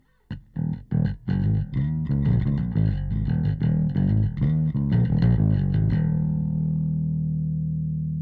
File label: bass; preset E Bass